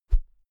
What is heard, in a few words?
whoosh thick low; swooshes-swishes